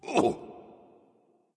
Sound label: grunt death; smite; npc; olympus